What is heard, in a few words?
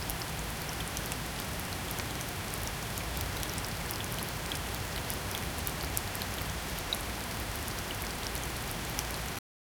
a gentle and serene natur, rain